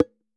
Double Bongo - D; percussion; Cymatics - Infinity Beta Pack 2.0; Zeus Percussion Collection

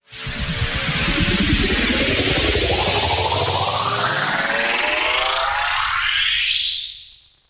Tension White Noise Up - 128 BPM; FX; House

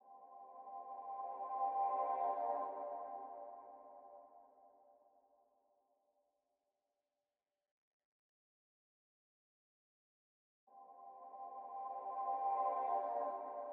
90 BPM C Min Texture; Melody; RnB; Loop Stems; Cymatics; 2022 Melody Collection - RnB Pt 1; Deep Love

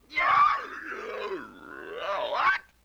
LoFi Synthetic Squawk

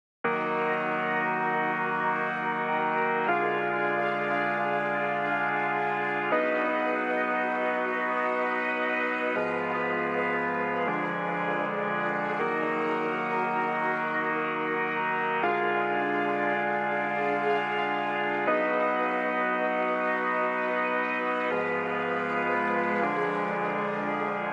158 BPM D# Min Chords, Hip Hop; Melody Loops; Cymatics; Barrel

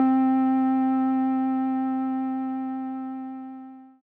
LEAD (C); Melodic One Shots; Artist; Cymatics; GHOST (Beta Pack)

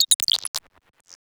Glitch

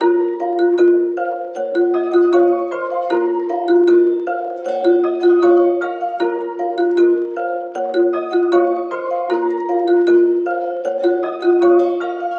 Dark Melody Loop - 155 BPM A# Min; Cymatics; 2020 Melody Collection

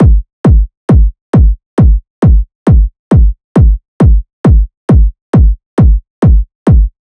Kick Loop 135, Techno Drums

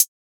Hihat; Traplord; Cymatics; DOPE DRUMS VOL. 1

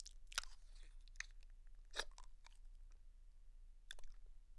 Suck On Lollipop; Fairlight Sound Lib

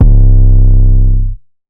Exhaust 808 - C; trap; 808s; Cymatics; Trap Starter Pack

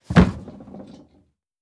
BF Slam Into Wood Wobbles; Fairlight Sound Lib

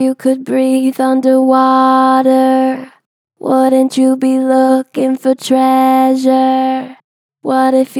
Pitch Flat Gentle, Vocals; What if you could breathe; Harmor Vocals

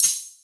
Ride, Dubstep Drum Shots; Rides & Crash; Demonic Ride; GhostHack; Ghosthack - Demolition - Free Dubstep Samples

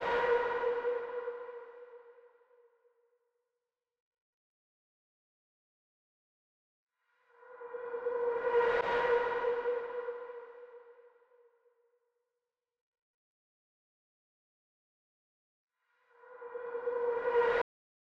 109 BPM A# Min SFX, loop stems; Melody; Cymatics; 2022 Melody Collection Platinum Expansion - Pt 1; Finish Line

